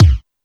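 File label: kick Faazed, Alesis D4; drum machine; 300 Drum Machines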